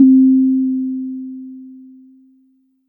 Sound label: Tongue Drum Lo